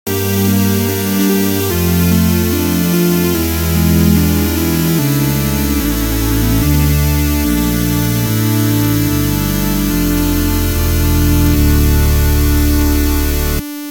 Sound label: Game Over; Legendary Axe 2